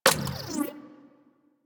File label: Shotgun Reload; Crp Shotgun